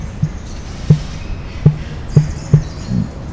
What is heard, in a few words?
Dromaius novaehollandiae drumming; Emu; Drumming calls are audible only at a close range to a bird